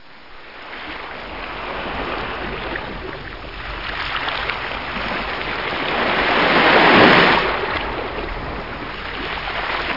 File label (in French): vague; nothingness